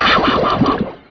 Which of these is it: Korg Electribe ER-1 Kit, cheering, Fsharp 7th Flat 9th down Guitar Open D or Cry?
Cry